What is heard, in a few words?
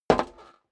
step boots metal dry single left; schritte